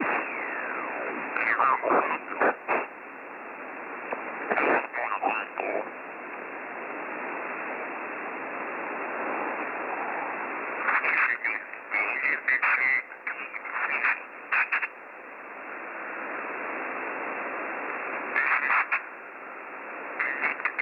Transmission Apparatus ssb tuning short; USO

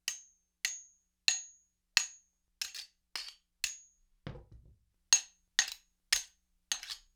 Mace Dagger Blade, Weapon on Weapon; StillNorthMedia

